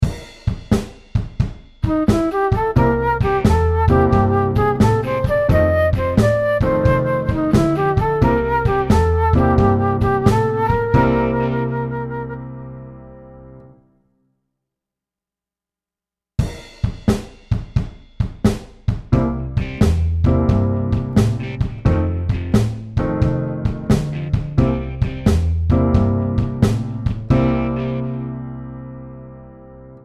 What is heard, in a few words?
Blues Riffs for Flute; Beginner Series